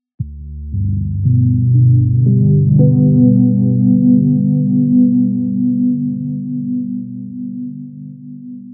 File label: Wavebell, jup8; Aphelion